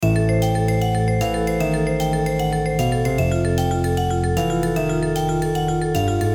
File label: Short mistery